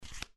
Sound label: Card deals out; Blackjack